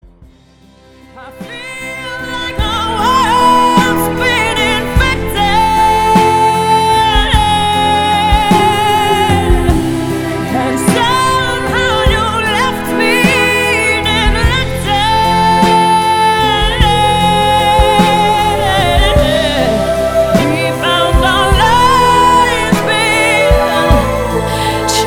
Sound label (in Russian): Вокальный крик